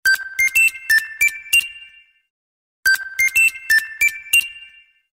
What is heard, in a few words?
Ringtone; LG; VC200-GizmoGadget